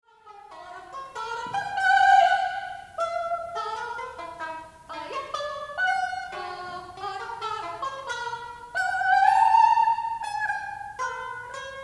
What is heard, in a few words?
Vocalise